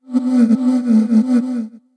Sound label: Whispers, Voices